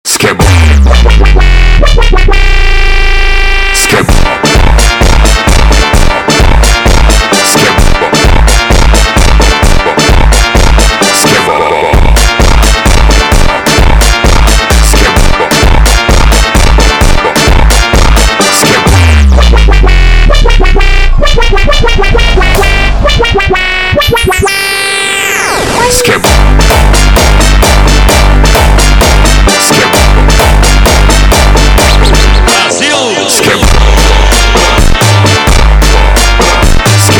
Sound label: Funk